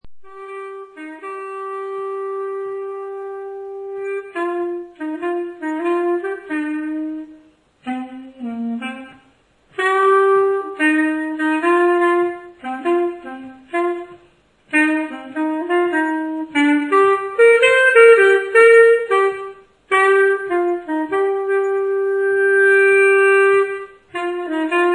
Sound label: xaphoon; Blasinstrumente